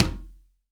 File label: CONGA, STEREO CONGA